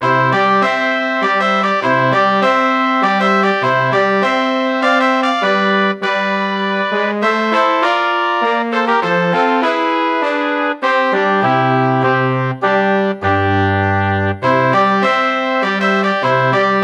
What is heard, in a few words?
Fanfare; Ultima III Exodus